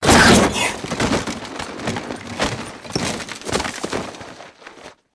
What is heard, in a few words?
Body Impacts Bike Falls Crash